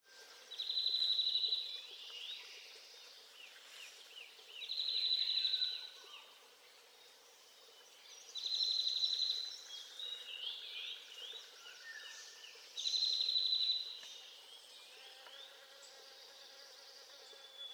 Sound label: Chestnut vented Nuthatch Sitta nagaensis, chestnut vented nuthatch call